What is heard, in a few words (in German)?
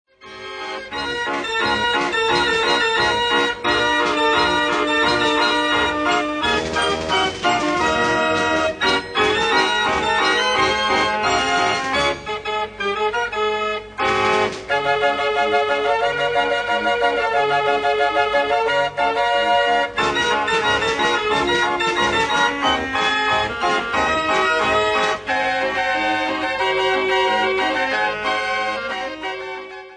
Kirmesorgel